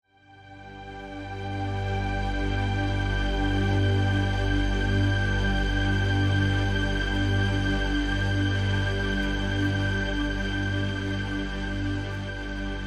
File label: 80s synth